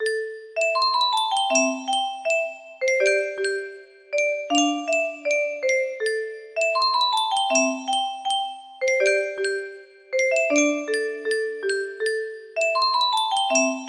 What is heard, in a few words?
music box melody